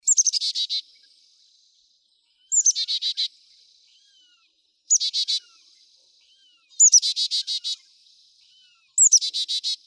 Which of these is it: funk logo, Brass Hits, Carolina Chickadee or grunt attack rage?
Carolina Chickadee